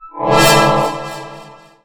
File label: power up